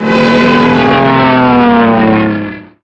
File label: dive